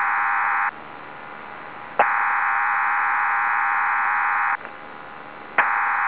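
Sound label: psk-16 channel, PSK 16 Ch.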